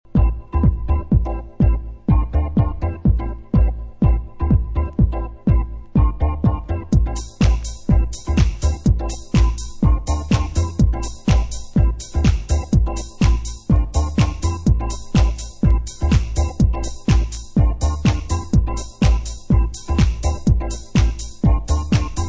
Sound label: bonus beats